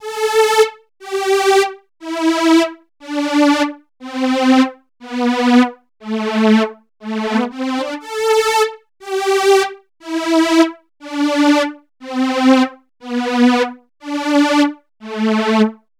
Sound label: Detuned Zippy Synth; Sonic Foundry (Sony Creative Software); Club Joints and Street Anthems; Ruff Side